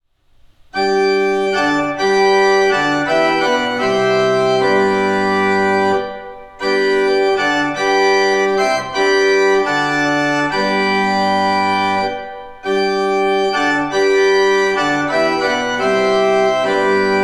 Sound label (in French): Claviers accouples Bourdon 8 Montre 4 Fourniture 2 3 rangs Gemshorn 8